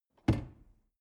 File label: drawer medium close lock